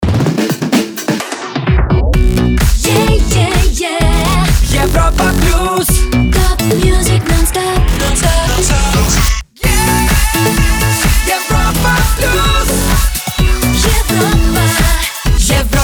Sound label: Jingle Main ID; Europa Plus